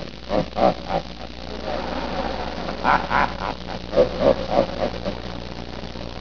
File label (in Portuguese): risada